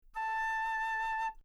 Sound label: flute; orchestration